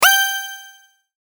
sword

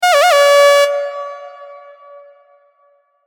correct; うにゃっとしたピンポン。その３。; 正解の音・ピンポーン

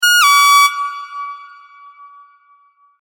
correct; うにゃっとしたピンポン。その７。; 正解の音・ピンポーン

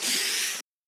paper

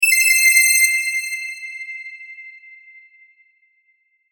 correct; おしゃれな正解音の超高音ver; 正解の音・ピンポーン

